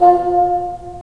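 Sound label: wind bassoon; LA Composer vol. 3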